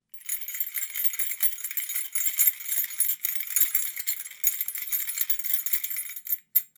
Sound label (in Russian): zvon kljuchej